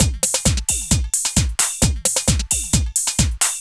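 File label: drum loops, right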